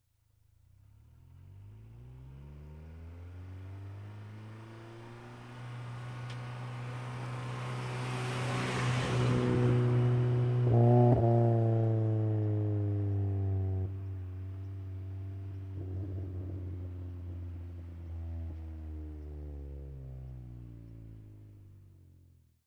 Integra, slow but high rpm approach, by, upshift, Acura Integra; acura integra ext.